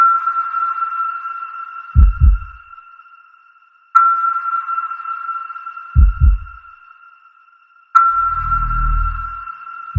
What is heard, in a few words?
piano